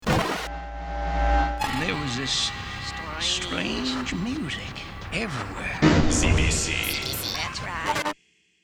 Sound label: strange music; An accident when the reel came off the spindle; WZBC; Begathon Production